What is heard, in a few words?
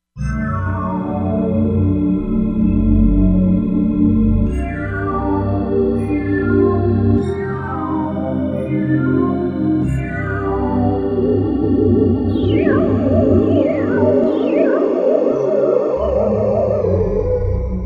Phantoms, Korg MSC-07S Synth; Korg MSC-07S Synth 2